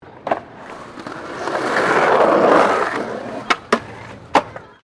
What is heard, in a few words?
SKATEBOARD FLAT JUMP; Skateboarding; Sports